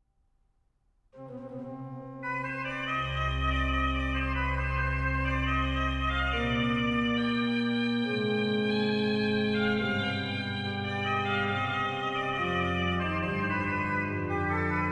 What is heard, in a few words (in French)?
Recit de Cornet; Chaumont